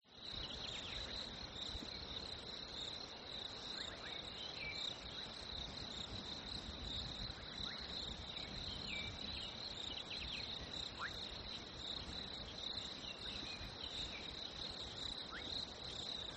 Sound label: ATMO NATURE Fresh Winter Crickets Birds; smartsound